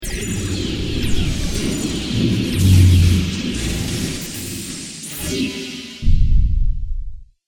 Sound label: Space Battle Outside Spaceship, spacecraft sound effects; Twistedtracks